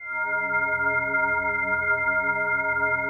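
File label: PAD, atmospheric pads; Best Service; XX Large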